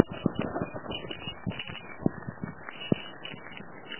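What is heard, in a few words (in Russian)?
шейкер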